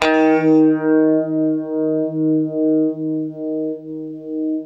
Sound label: UNIVIBE D#2, guitar; East West - Steve Stevens Guitar Samples Collection